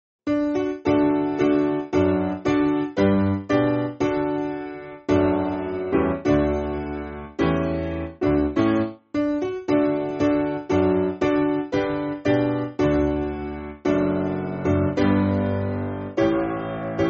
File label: Piano, church music; Better World